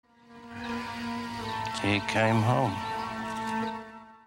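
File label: Halloween notification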